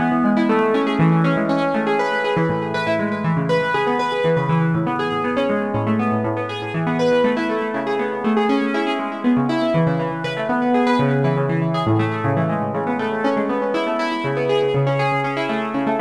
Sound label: arpeg